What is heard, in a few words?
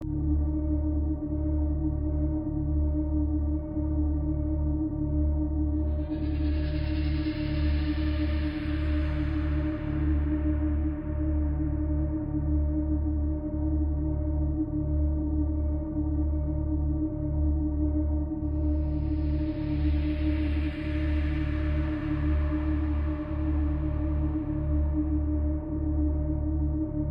Main Area Drone